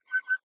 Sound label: Detector, notifications; Yota Yotaphone-2